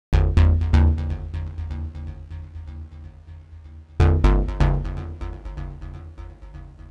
synth 124 F

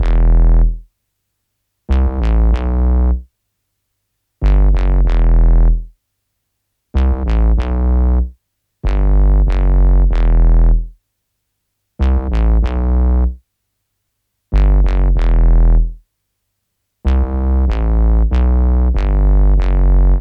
95 Bassline Em

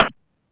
Perc

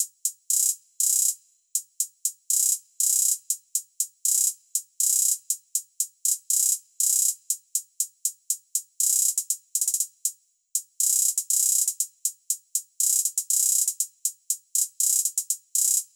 Hat Loop

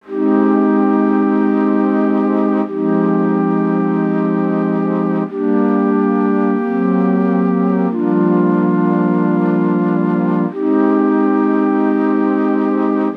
92 melotron flute chords Ebmaj

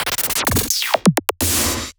Drums Fill 128bpm 1bar

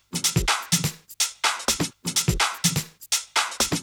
Drum 125bpm No Kick

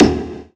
percussion

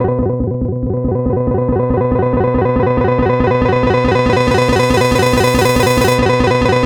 140, B, News Arp

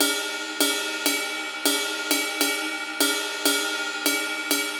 Ride Bell 100BPM Acoustic Clean

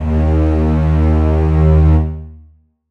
Strings Eb1

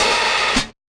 OPEN HAT

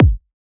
kick

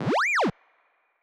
fx oneshot siren sweeps complex short spring verb; Deep Space Textures V2

